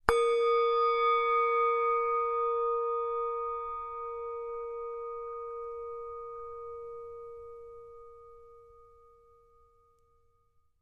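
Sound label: Bowl Medium Strike, singing bowls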